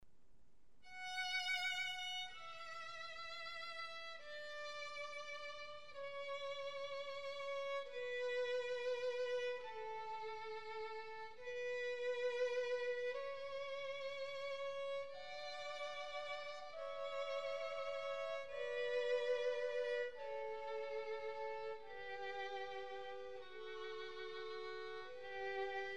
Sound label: Pachelbel Canon